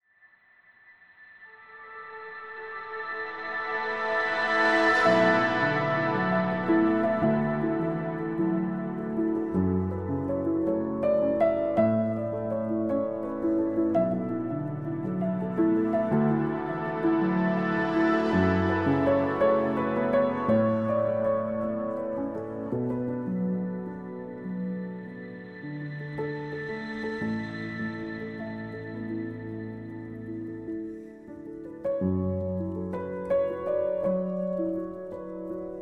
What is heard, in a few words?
piano miniatures